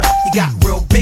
beep

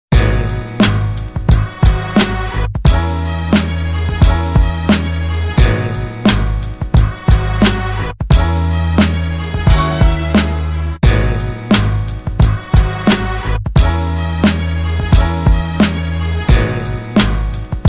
dirty game instrumental

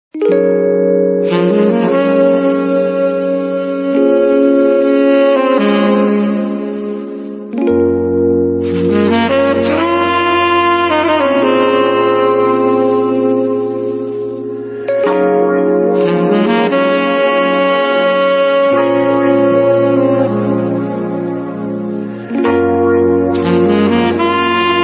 romantic saxophone

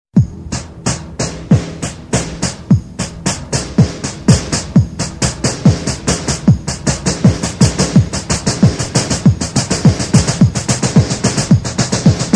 fast drums

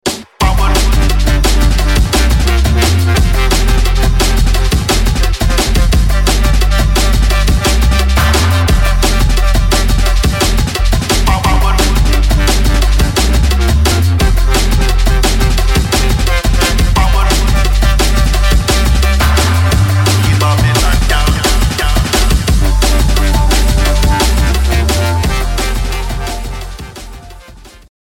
fresh dnb

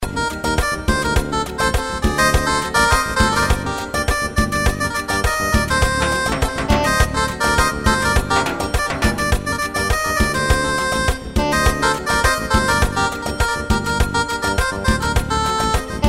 funny tune

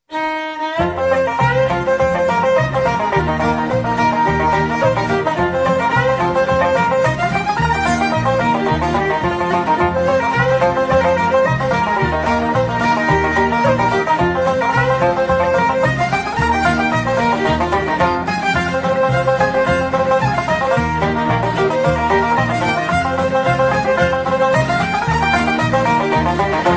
irish tavern music

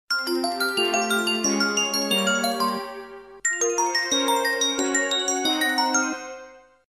sms tone

